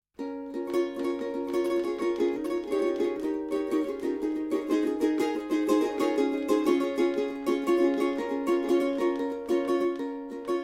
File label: delay